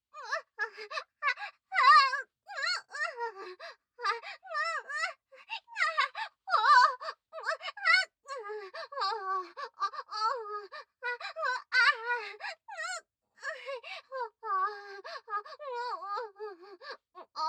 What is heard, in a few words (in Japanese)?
乱交喘ぎ